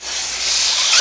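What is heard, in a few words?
lightsaber holster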